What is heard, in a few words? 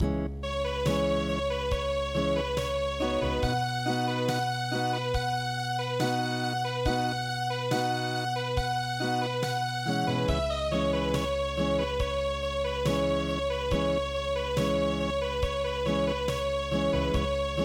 camel bell Unplugged